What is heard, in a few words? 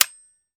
AK-47 dryfire; zps; Weapons; Firearms